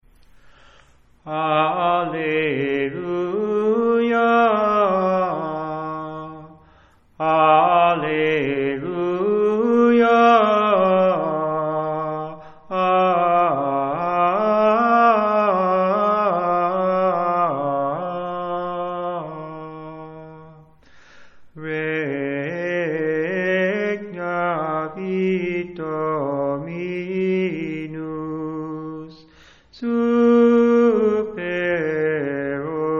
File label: Alleluia, sacred chant; Ascension; Regnavit Dominus